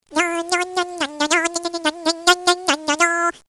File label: belly laugh warning